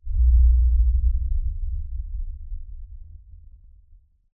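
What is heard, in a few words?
Explosion Rumble Exterior Distant low frequency effects; Boomtown; Titanfall 2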